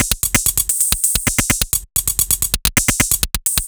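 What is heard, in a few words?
Odd Glitch, 130 BPM, Percussion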